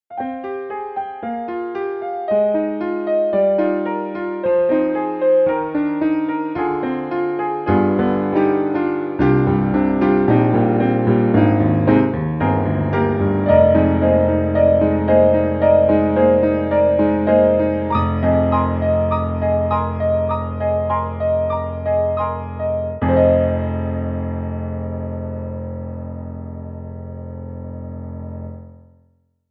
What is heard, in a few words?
Steinway; Sfz SF2 WST25F